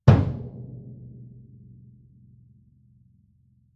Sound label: bass drum muted fff; Struck Membranophones; Bass Drum 3